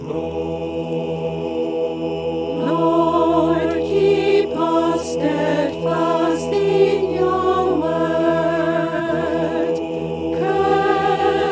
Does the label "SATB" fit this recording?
Yes